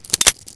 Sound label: suicide prime, glock